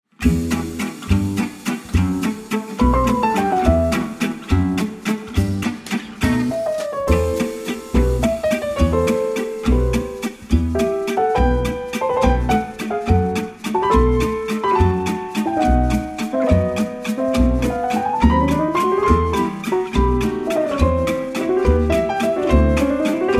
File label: French Romantic Kiss